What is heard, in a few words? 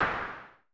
SN Filtered, Vermona Mono15 Drums Snare